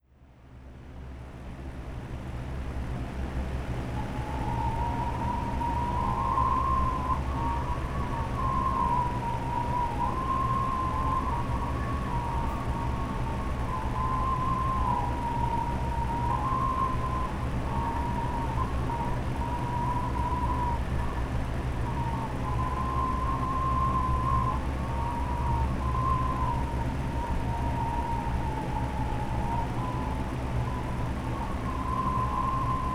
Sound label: Wind Flute With Fan